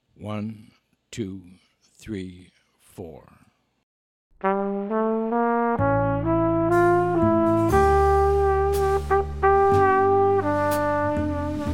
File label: minus Piano